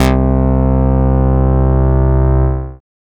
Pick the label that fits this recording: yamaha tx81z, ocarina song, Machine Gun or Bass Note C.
Bass Note C